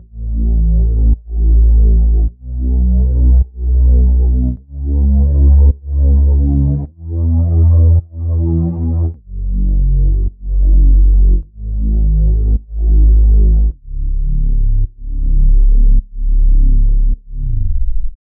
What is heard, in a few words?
Synth loop 105 C#m; Synth Bass Loops; Slate Digital BASSHOLE Sample Pack